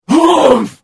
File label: Hit; The Elder Scrolls III - Morrowind